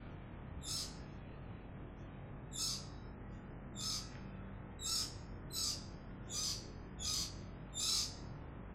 Analysis lorikeet chirps, Rainbow Lorikeet, Brisbane, Australia, morning, post-dawn chorus